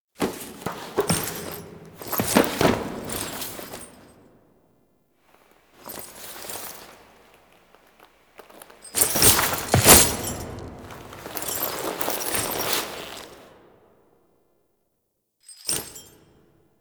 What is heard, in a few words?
Romance Cin Foley A; Roathe; Warframe; Tau Romance Cinematics